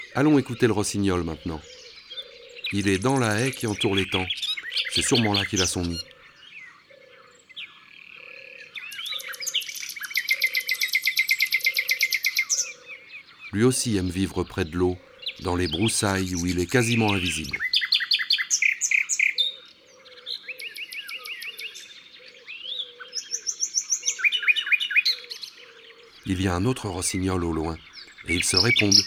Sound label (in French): Rossignol philomele; ABC des chants d'oiseaux